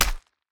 grapple Attach Eva; Kerbal Space Program; KAS

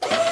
telescopic Motor start; Kerbal Space Program; KAS

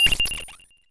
blip; Kerbal Space Program; Chatterer; beeps

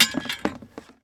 strut Remove; Kerbal Space Program; KAS

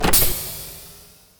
refuel Eva; Kerbal Space Program; KIS

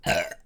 food Burp; Kerbal Space Program; KIS

